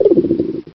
Dove, Cheesy Lo-Fi Sound Effects

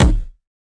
floppy bounce kick

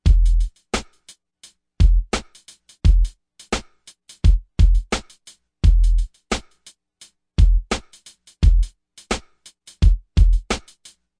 086 bpm loose playa groove, DRUM LOOPS and BREAKS

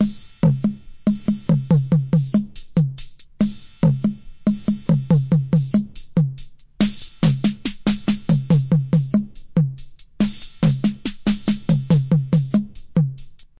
114 casio cheesy roller, drum loops and breaks

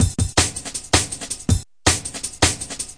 161, drum loops and breaks; 161 to 180 bpm

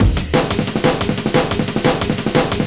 break, DnB Breaks 179 bpm; 141 to 185 bpm; Ethex

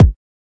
kik

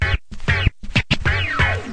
scatch me in, Scratching and Turntablism; DRUM LOOPS and BREAKS

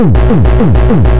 gabber smasher, DRUM LOOPS and BREAKS, 200 bpm

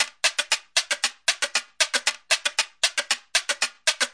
116 wood fish, drum loops and breaks

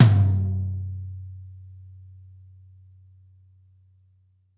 classic hh Lo Tom1, Classic Hip Hop; STYLE KITS (Hip Hop, Etc); Classic Hip Hop Mega Kit

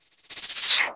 FX, Roland R-8

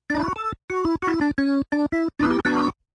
organ

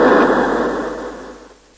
big bang, video games

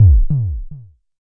Jomox MBase, drum machine; Jomox MBase 01

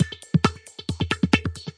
135 robot ride, drum loops and breaks

